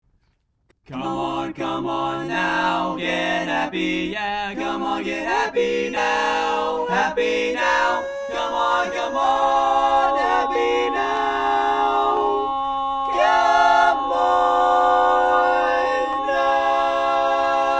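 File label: Baritone, barbershop tags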